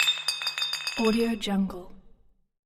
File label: impacts bottle drop cement; omni